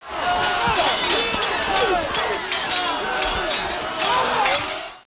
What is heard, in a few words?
SWORD FGT; CIV2; WARHAM